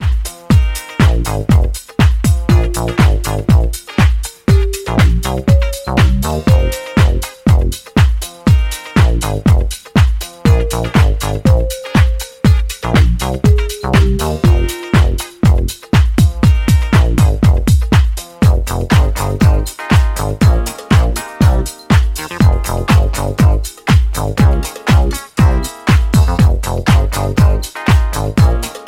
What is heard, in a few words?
Just A Funky Groove